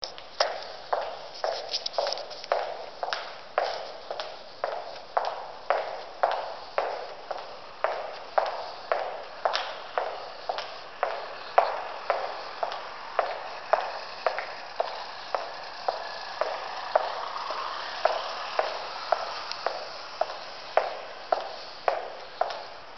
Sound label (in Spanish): puentes